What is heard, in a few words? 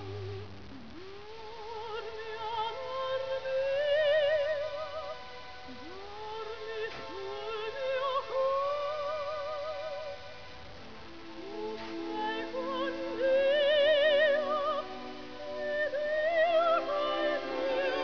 LULLABYE